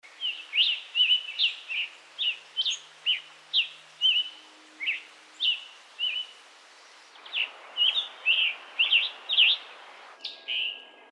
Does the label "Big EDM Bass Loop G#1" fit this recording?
No